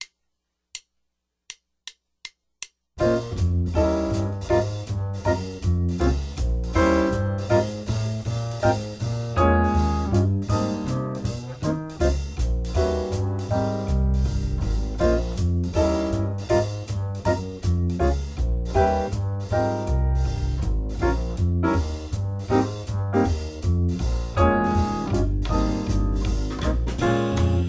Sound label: Bbm7 Eb7 Abmaj7 2 bars 160